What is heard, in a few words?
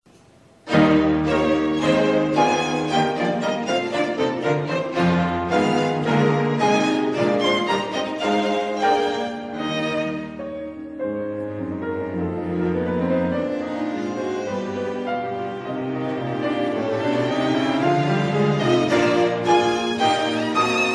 Schumann Piano Quintet E Flat Major